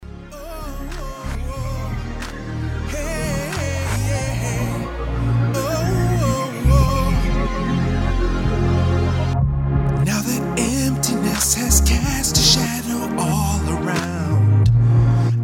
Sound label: distorted